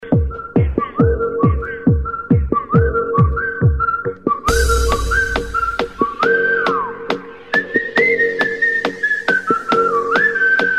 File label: dance